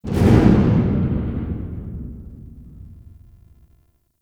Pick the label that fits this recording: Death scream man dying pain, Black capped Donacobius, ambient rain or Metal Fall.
Metal Fall